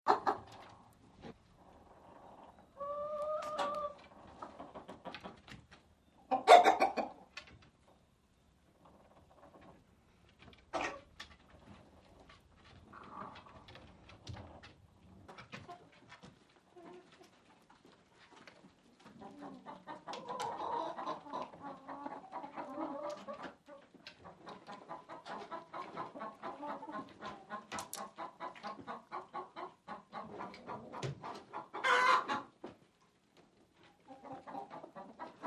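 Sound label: Hen House Int Peaceful; chickens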